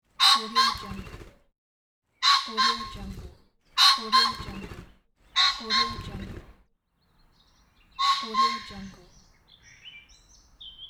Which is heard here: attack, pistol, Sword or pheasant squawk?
pheasant squawk